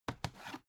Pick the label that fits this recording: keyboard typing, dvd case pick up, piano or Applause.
dvd case pick up